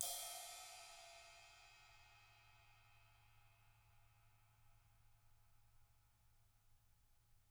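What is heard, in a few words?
CRASH 18 TIP, CYMBAL; CYMBAL KITS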